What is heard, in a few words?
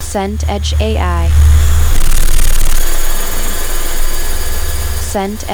helicopter hover